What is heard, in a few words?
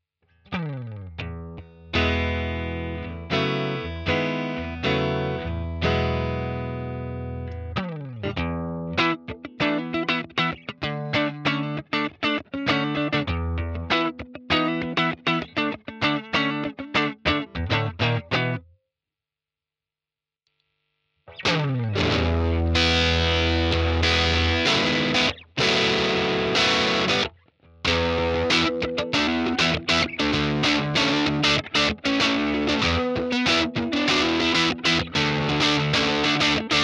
KATANA FENDER